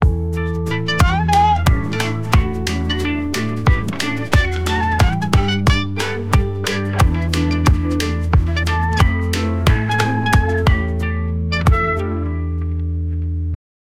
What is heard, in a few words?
country music cowboy